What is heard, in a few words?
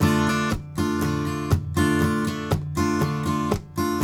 RIFF, Blues Guitar; ILIO; Fretworks